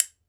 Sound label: B. BONES, Celtic Percussion; E-MU Producer Series Vol. 7 – Old World Instruments